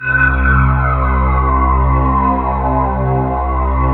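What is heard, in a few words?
synth Morpheus; Sound & Vision - Gigapack II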